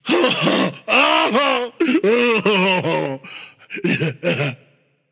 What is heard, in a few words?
boo hoo; smite; olorun